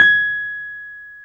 PNO, Wurly

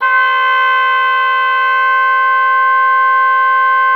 OBOE3; Xsample VOL-6

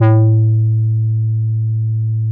JP8 A1, Jupiter 8; Big Fish Audio - First Call Vintage Keyboards

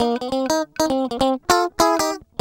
GUI, LICKS; 100 LICKS; Sound & Vision - Gigapack II CD 1 (Roland); 90 s Sample CDs

